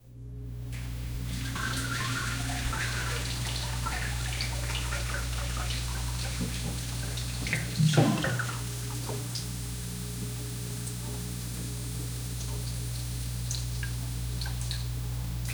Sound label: realistic sound of a pers, bathroom